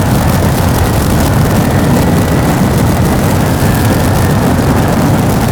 Capture Obj Ship Thruster Loop; Warframe; Grineer Galleon; Capture Objective Room

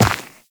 Run Dirt, Footsteps; Warframe; Tenno

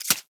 Micro Step Snow

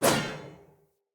Player Sphere Polearm Whoosh; Weapons

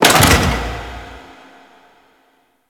Gas Storage Container Lower Stop; Warframe; Corpus Gas City; Ambience

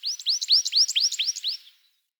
Tree Birch Bird; Trees

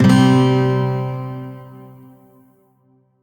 Shawzin Chromatic Chord; Warframe; Lotus Shawzin

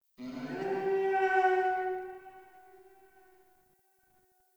Tree Distant Critters Angry; Ambience

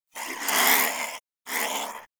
Cryptilex Vocal Snore; Characters; Warframe; Hunting Critters

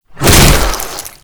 Small Arm Swing, Proto Infested Ancient; Warframe; Abilities

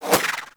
Throwing Star Whizzby; Warframe; Bullet Impacts; Whizzbys

